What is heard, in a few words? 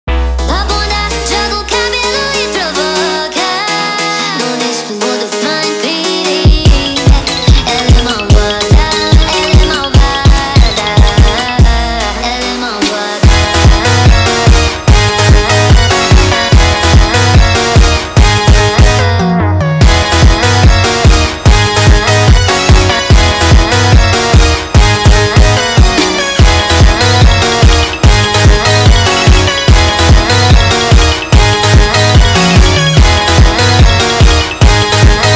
sped Up, فانک